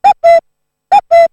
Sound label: CUCKOO CLOCK ALARM RINGTONE